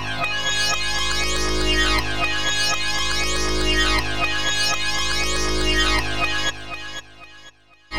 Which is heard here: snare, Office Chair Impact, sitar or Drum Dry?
sitar